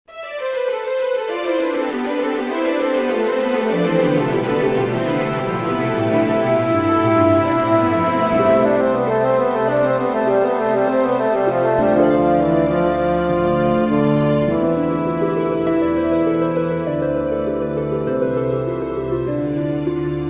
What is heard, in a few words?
Bassoon Conc 1st movt; orchestral music